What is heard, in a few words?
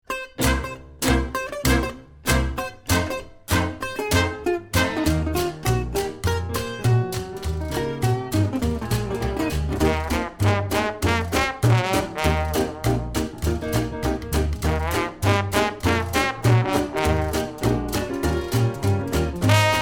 New Orleans; Guitarist